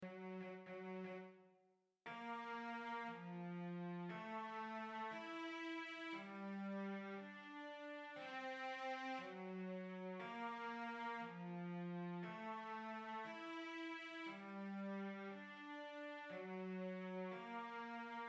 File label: Cello